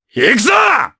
Vox Attack, jp; kingsraid; voices heroes jp

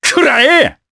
Vox Attack, jp; kingsraid; voices heroes jp

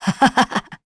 Vox Laugh; Shamilla; kings raid; en

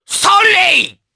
Vox Attack, jp; voices; heroes; Oddy; kingsraid

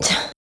Vox Landing; Ripine; kingsraid

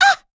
Vox Damage; Pansirone; kingsraid

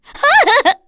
Vox Laugh; Cecilia; kingsraid

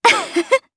Laugh, jp; Yanne; kingsraid

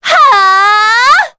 Vox Casting; Scarlet; kingsraid

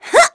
Vox Jump; Aselica; kings raid; voices heroes en

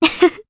Laugh, Jp; Scarlets; Kings Raid

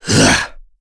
Attack, Vox; kingsraid; voices heroes en

